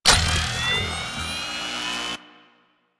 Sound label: Long Gun Charge; Warframe; Thano Tech Arch Long Gun